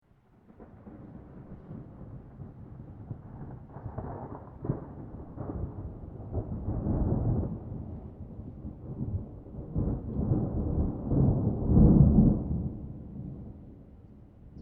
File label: Thunder Rumble Growing intensity: A distant thunder rumble; in several stages, thunder claps rolling in; Thunder Sound Effects; Lynne Music